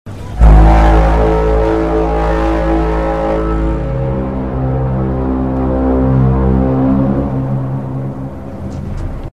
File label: Tripod Sounds Car Horn